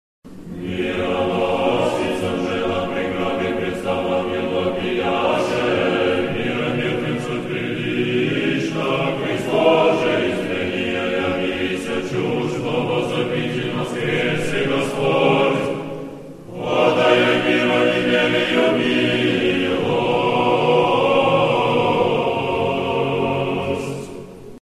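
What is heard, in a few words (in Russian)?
Мужской хор Тропарь женам мироносицам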